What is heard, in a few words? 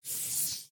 Marker; Game rip; MiSide